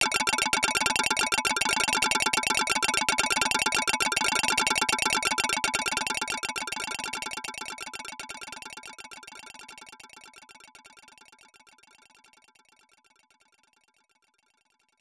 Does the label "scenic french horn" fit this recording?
No